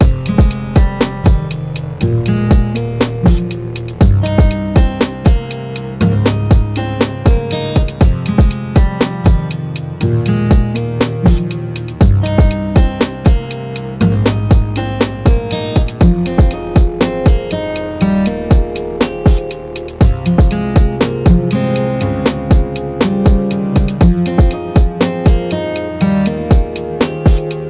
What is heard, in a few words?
Summer Guitar